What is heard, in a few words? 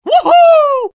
battlecry; vo; scout